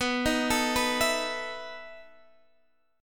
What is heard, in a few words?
E Major 7th Suspended 4th, B